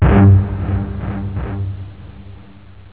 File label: guitar delay; jazz jackrabbit 2